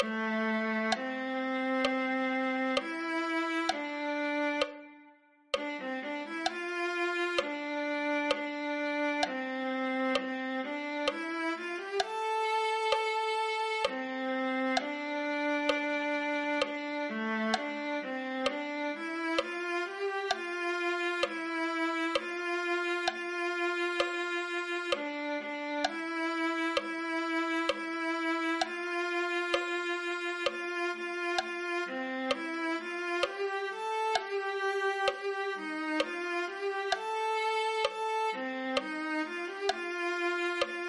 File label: viola